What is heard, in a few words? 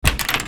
Screen door open; household